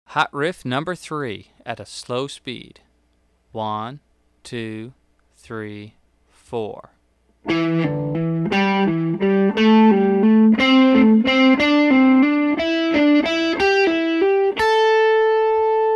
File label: Hot Licks Riff